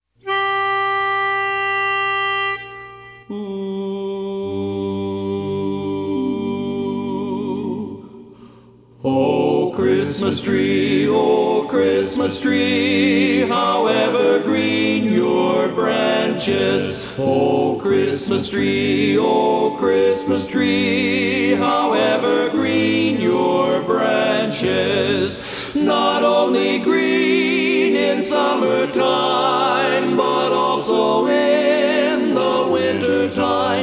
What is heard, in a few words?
lead; o christmas tree